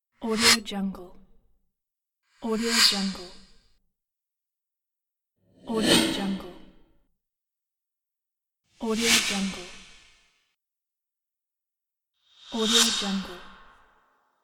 swishes

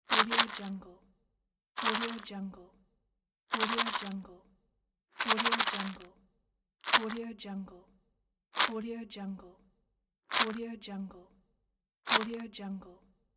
shaking

